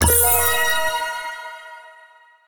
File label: game start